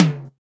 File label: TOM, Eurotech; Sounds Good